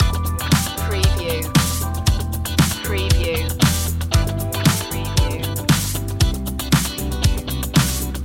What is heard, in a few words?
daft funk loop